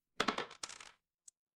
impact dice roll

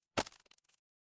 impact crate break

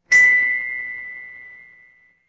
instrument chime bar

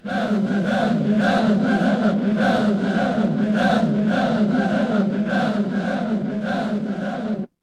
crowd gasp

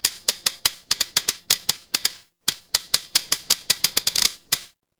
mechanical slot machine

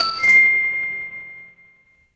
instrument chime bar

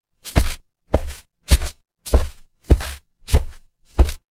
footsteps concrete

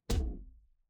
retro game hit

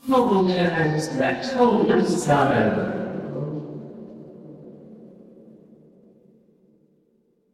crowd murmur

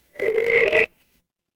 animal frog

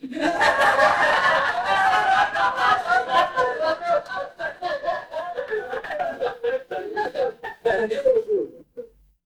crowd laughter

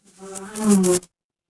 animal insect

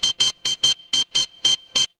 ui beep

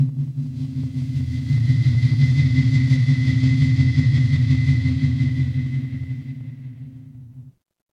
mechanical machine

